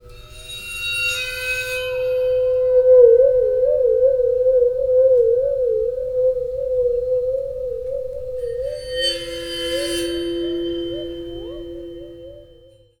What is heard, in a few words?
Waterphone